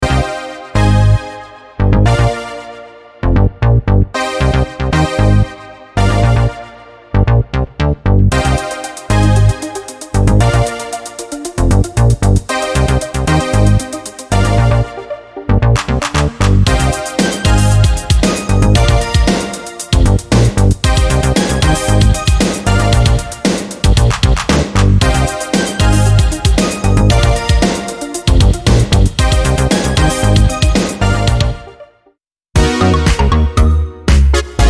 e funk synth

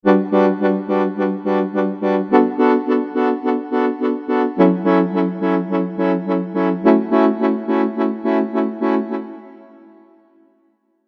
serum chords; chiqui audio

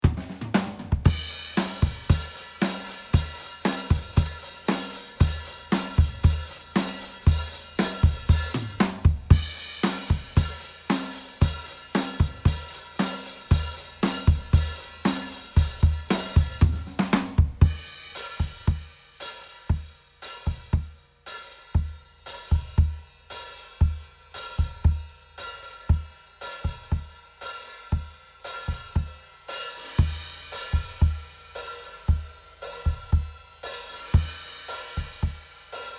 boost live drums

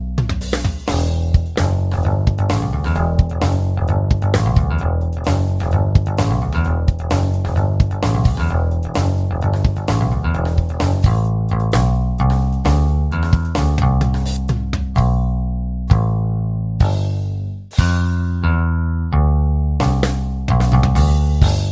picked electric bass

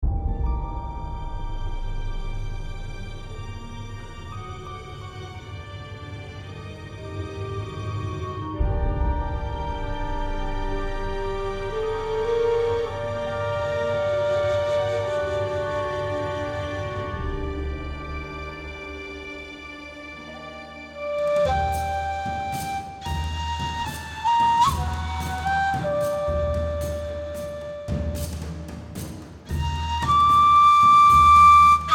desert winds

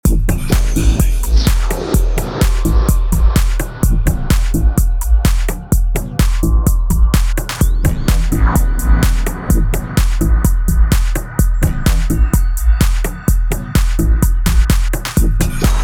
bass techno; bass master

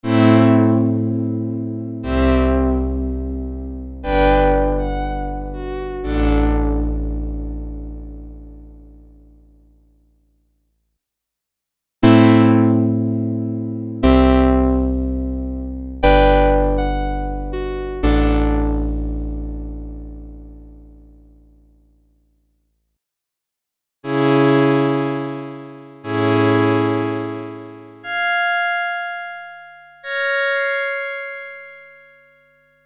unprocessed sound; art plugin

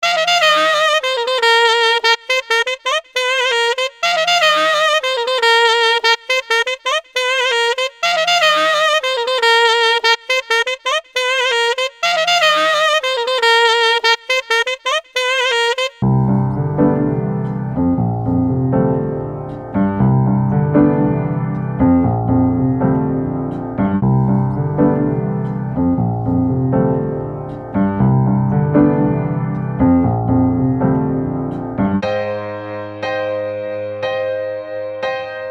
various instruments; st3reo

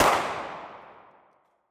9mm handgun gun shot fire

